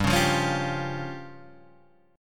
F Suspended 2nd Sharp 5th G

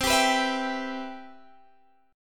C Major 7th, C, chord

